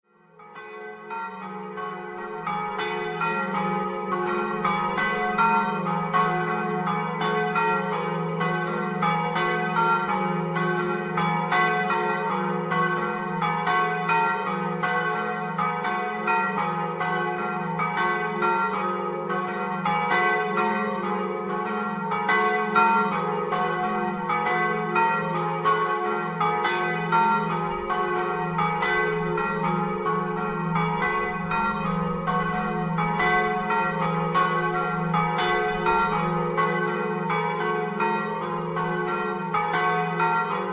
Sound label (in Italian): campane; CERESETTO